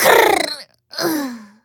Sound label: hit